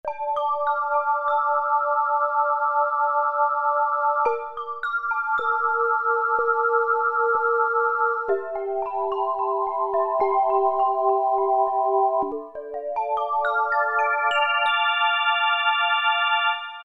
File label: Mallet Bells, E-mu Pro-Cussion; Factory ROM kits